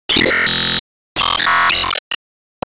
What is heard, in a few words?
Starbox Open Normal; Disney Star Smash; Android, iOS, Mobile